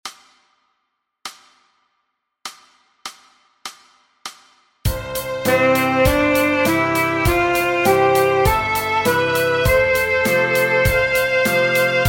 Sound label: Sax A Scale Quarter